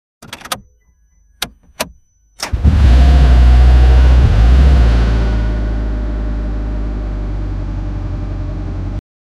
car ignition jeep engine

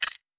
a sudden sharp metallic, clock

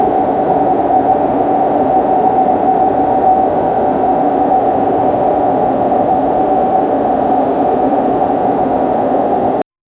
recording audio while on, transit

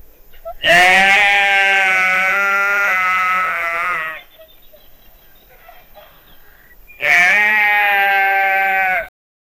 a bleating sheep wants to

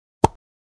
hover over a card in, game ui

